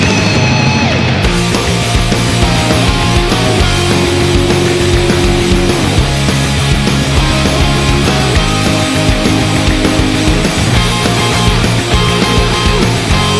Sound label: Instrumental